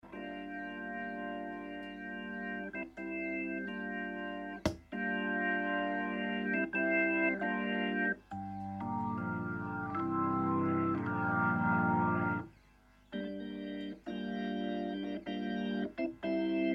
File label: Off On, Hammond T522; Starts without overdrive and then adds overdrive. Notice how transparent the Vox appears to be.